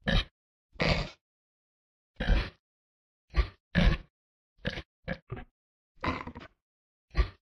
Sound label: Nervous